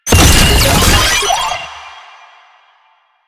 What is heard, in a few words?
Sniper Drone Deploy; Enemies; Drones; Warframe; Lasrian